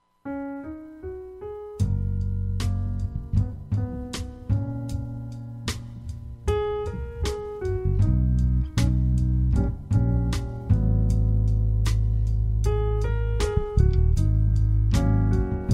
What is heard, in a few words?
jazz